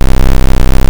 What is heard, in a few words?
menu; Cavern-Quest